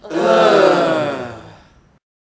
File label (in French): Bruitage Foule; Effets Sonores